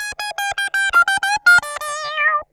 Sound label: SQUEEZER, Funk Guitar; 90 s Sample CDs; Zero G